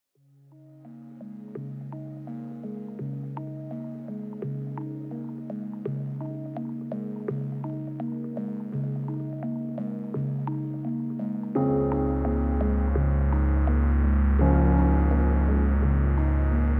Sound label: House Bass Station, Novation Bass Station; Synthesizer; Jogging; Bird Watch; Black Octopus Sound